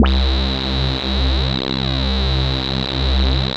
CHUNKY C3, Drums; Drums In Your FACE!

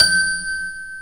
G 5 TOY PF, TOY PIANO; AKAI S6000 CD-ROM - Volume 1

